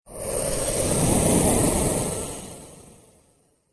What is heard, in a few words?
Whoosh Space Vehicle Slow Spacecraft; Scifi Science Fiction; Spacecrafts